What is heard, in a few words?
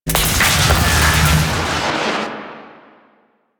Portal Start; Entrati Labs